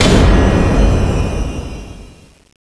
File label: count start; thanatos11